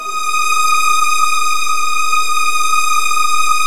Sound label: D#3, Virtuoso Strings; ILIO; Kirk Hunter Virtuoso Strings VOL-1